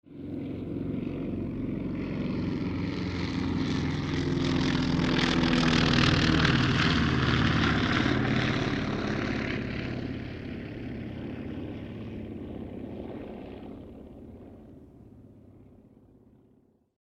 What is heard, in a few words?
helicopter passing by